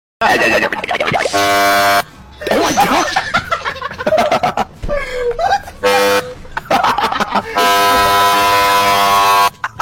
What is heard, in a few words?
weird microphone